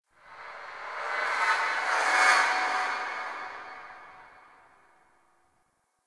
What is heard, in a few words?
Sentient Lair Spirit Chatter Two; Warframe